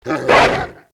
Attack

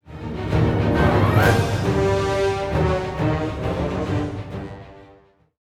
Pirate Play Stinger

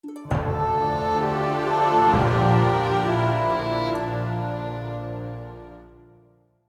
Legendary Stinger; Barak Kodobane

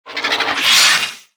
Cathedral Gargoyle Attack

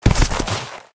Camel Death

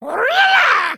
Male Murloc Attack

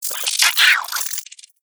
Scarab Attack

